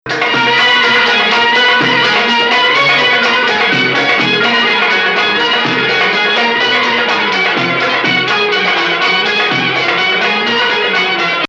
Nahawand - Bayati

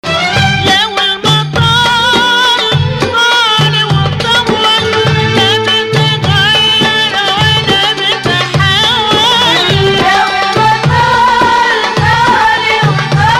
Rast Jiharkah Octave

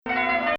Rast Kull illy habb Rast Octave

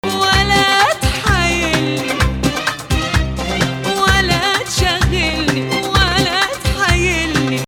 Hijazkar Rast

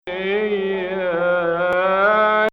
Pseudo Sikah; Zanjaran

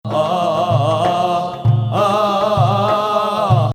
2nd Ajam

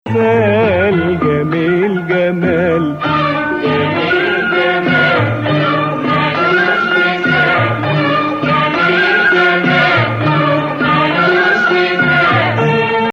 Nakriz; Nawa

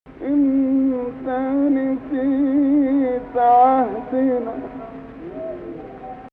Rast Fein Ya Gameel 2nd Saba

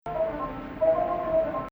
Rast Fein Ya Gameel Rast

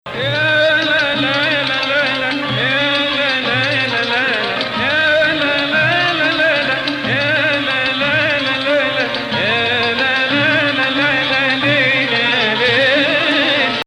Sikah Jadaka Rast

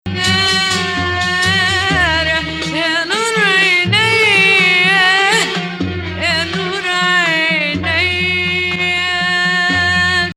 Rast Hijaz

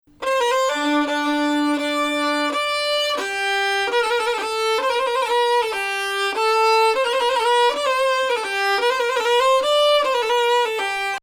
Bayati Samai Rast

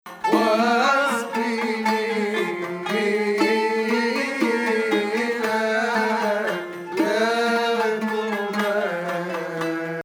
Hijazkar Octave

